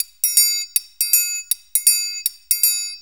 Shuffle Perc, Perc Loops; Sonic Foundry (Sony Creative Software); Drum Tools; Shuffles

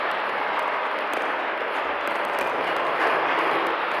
sparse soundscape samples; ReLooped

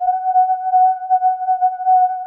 DS8 F#2, Korg; Back In Time Records; Korg Universe VOL-1

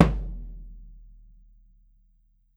SURDO FF, Brazil Drum Perc; E-MU Producer Series Vol. 7 – Old World Instruments

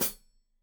Hat Foot, Hi-Hat, Cymbals Stereo

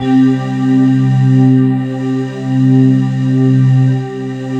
SYN, Wavestation

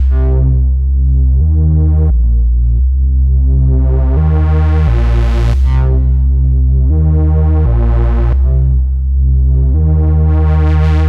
Som Bass, Drum n Bass; KJ Sawka; Drum n Bass & Beyond

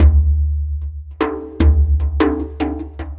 Dumbek Big; Sonic Foundry (Sony Creative Software); Ambient Atmospheres & Rhythms

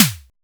CHZ SN DEEP, TECHNO; Drums In Your FACE!

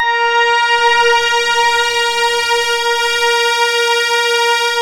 A#, Synclav FM; Big Fish Audio; SPACE1

